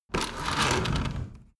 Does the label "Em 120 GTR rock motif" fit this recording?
No